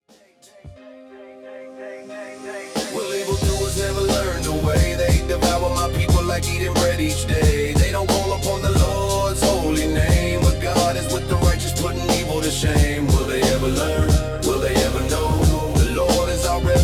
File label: RAP; Psalm 14 Wickedness of Men